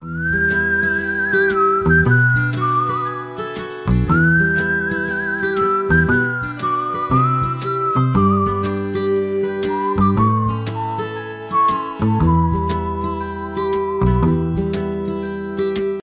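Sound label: Smooth whistle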